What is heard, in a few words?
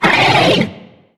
small growl; steven